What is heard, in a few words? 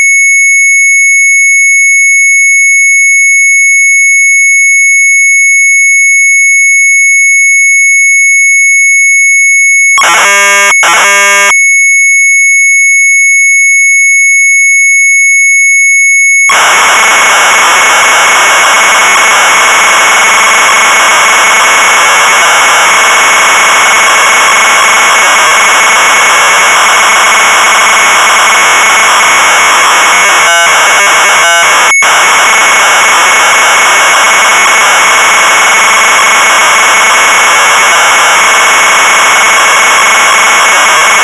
MZ800 Tape; RND TEST; retromelsarchive; sharp mz800